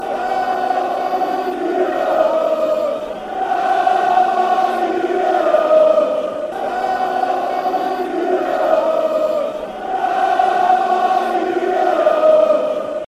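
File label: come you yellows, football chants; bcfc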